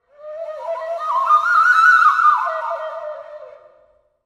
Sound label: Columbian Flute